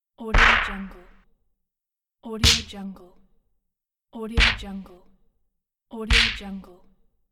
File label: scuffle punch; livingroomclassic